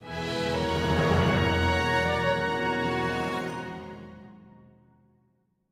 Stage Start; code shifter

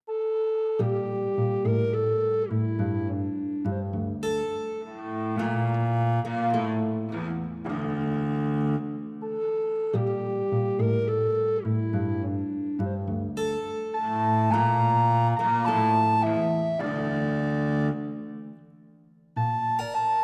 Explore Music Loop; fat princess adventures; ps4

